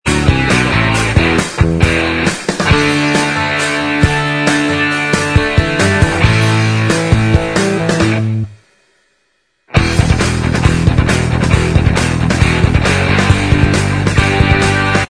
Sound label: Inst Track